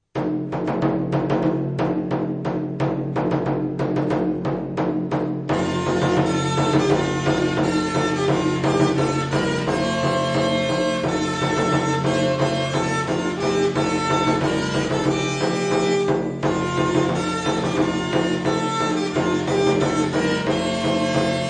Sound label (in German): Marsch